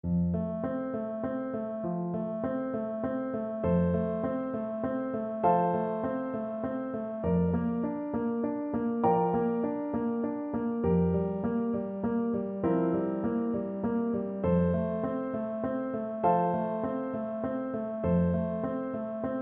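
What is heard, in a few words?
recorder karaoke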